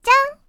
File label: match ready; [Mirakuru] Hanasaka Yui Wangi Wangi